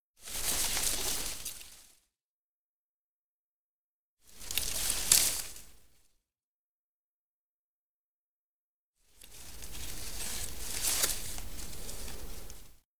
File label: Leaves